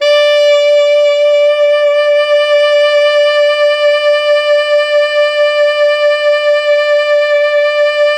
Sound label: Pop Brass, D4 -R; Best Service ProSamples vol.19; AKAI